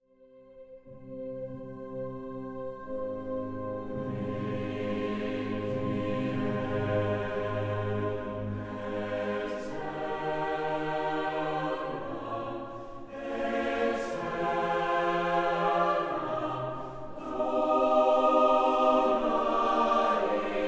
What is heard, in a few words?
Requiem